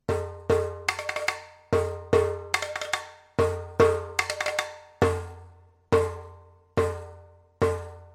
the beat

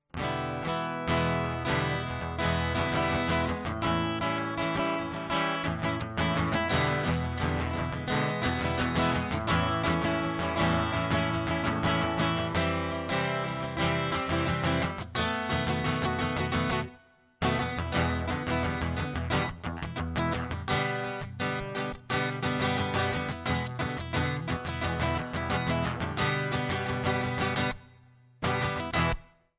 guitar; MegaEpix Enormous

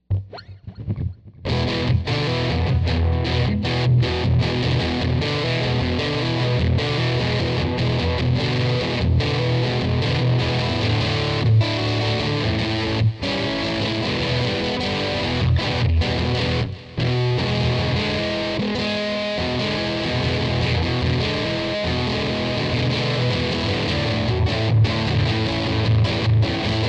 electric

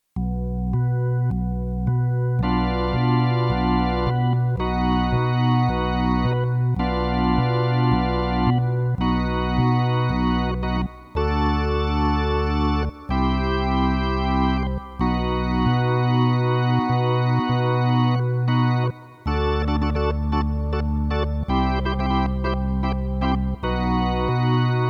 organ

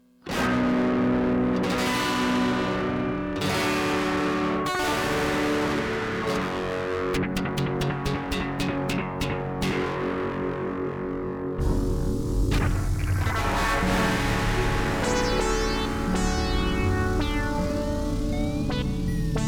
electric intro

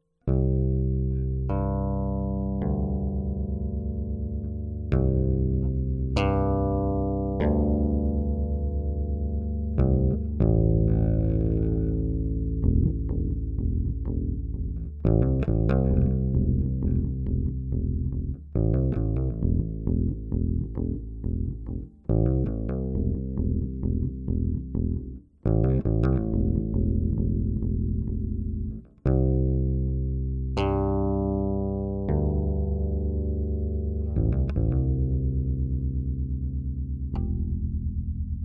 bass

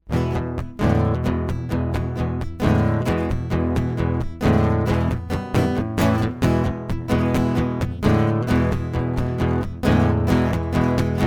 Thick Rhetoric acoustic

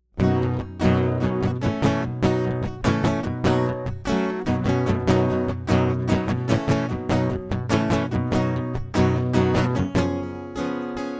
acoustic

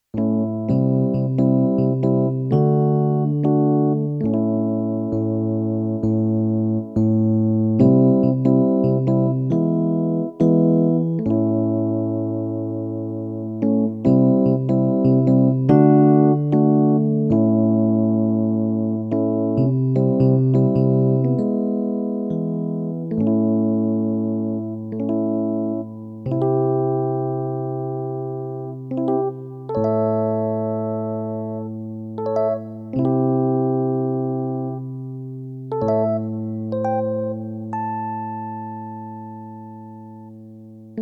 the keys

